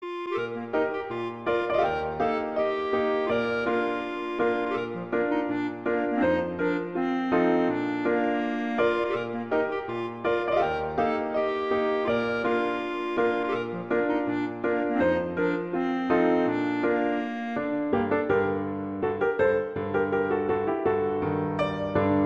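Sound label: Allegretto, violin; Brahms; Hungarian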